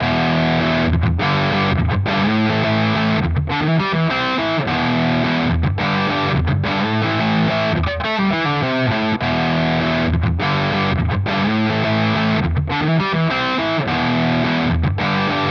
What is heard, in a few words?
Mooer ProCo Rat; Audiovergleich Mooer Nux